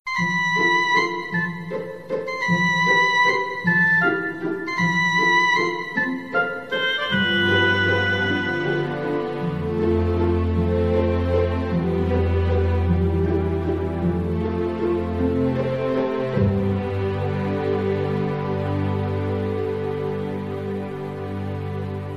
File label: playful trans